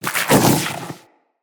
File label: Root Spider Mother Web Shot Proj Whizz By; Animals; Spiders; Abilities